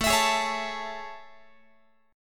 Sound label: A Major 7th A, Guitar; AM7; strummed; x,17,19,18,17,20